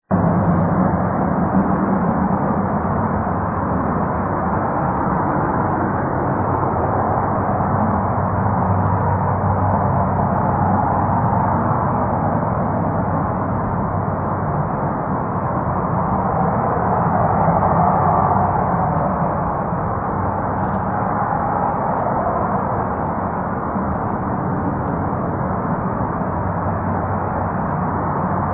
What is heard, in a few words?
sound jet deep; KSP